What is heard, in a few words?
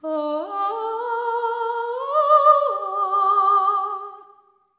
LAMENT